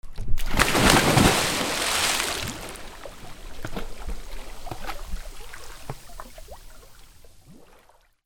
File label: WATER